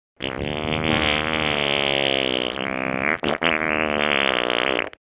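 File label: fart sound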